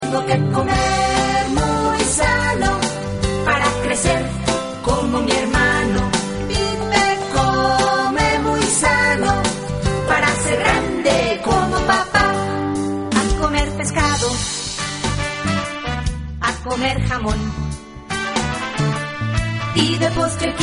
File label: pipe